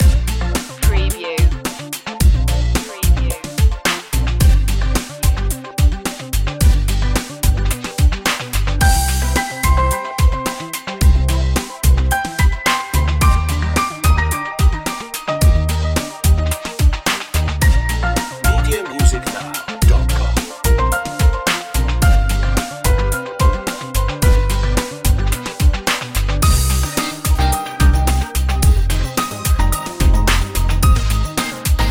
electro groove, Short edit for looping. A slightly funky groove with piano; pop